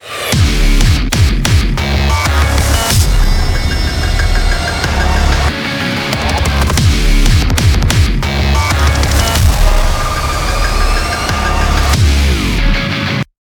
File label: Heavy; Doom; Transistor Fist